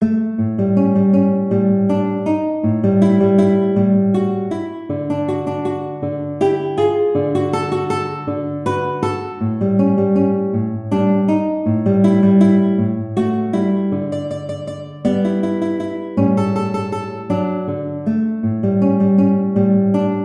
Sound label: Waltz, guitar; Strauss; Costa